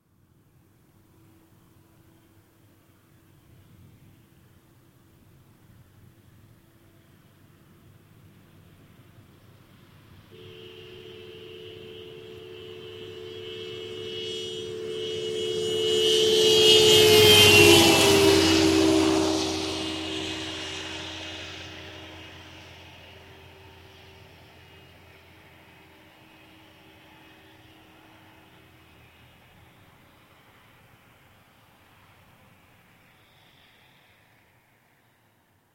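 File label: zvuk mashiny, car sound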